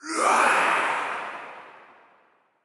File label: strike